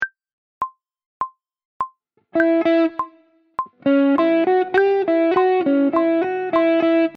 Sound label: lick blues; guitarra; 3 licks de blues